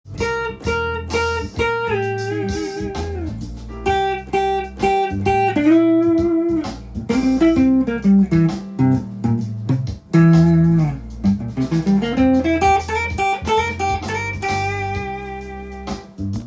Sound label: c7 chord tone